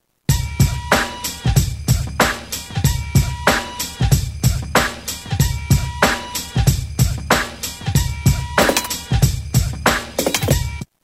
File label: Gangsta 94, Roland M-DC1